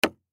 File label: Hammer Wood Nail Single; Hammers; Tools; Industry; Blastwave